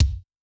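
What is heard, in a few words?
bass drum, Kick, Yamaha DTXpress-II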